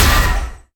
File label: Impact Arcane; smite; god merlin